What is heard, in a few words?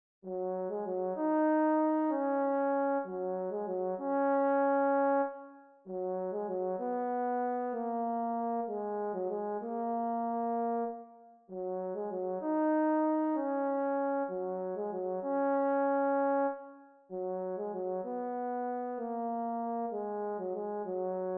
Horn